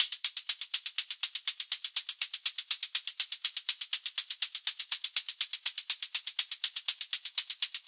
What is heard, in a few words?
122 BPM Hats Hi Hat; mmb drum arsenal vol 1; Hi Hat Spectrum